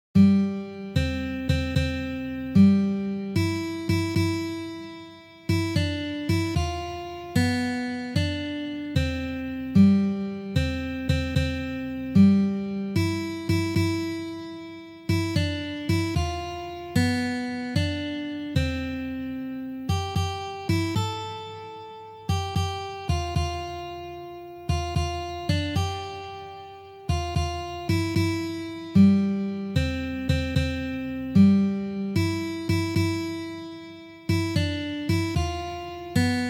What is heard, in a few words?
O Christmas Tree Easy Normal